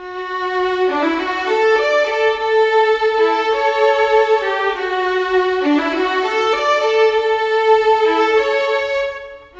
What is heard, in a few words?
Violins; Sonic Foundry (Sony Creative Software); Orchestral Series VOL-4 - Rock and Pop